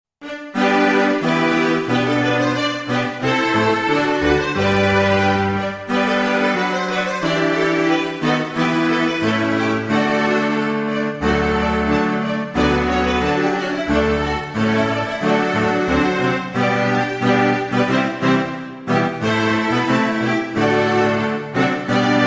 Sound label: Midi; lbcastle